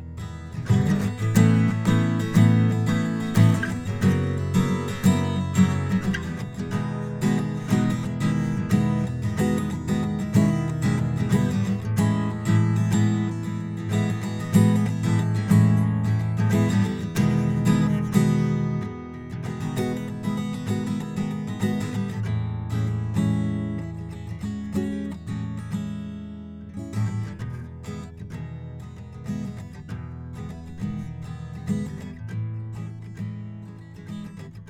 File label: takamine; tc electronic konnekt 6